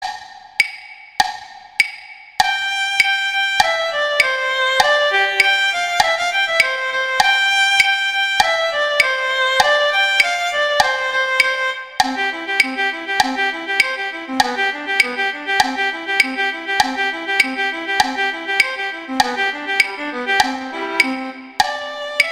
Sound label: Andantino Guitar; Paganini; Sonatas Op1 Vl Gu; Sonata No 4